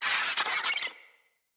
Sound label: Infested Ashen Vocal Attack; Enemies